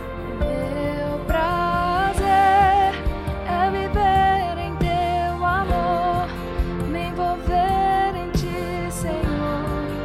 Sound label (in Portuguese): SOPRANO